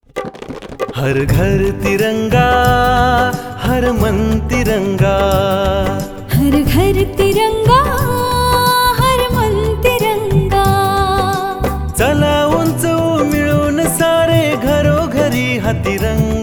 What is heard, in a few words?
Marathi Jingle